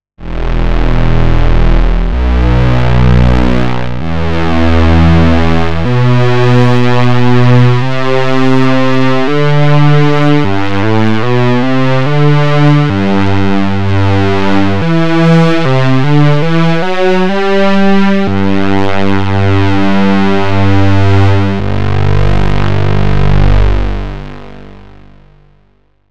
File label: STRINGS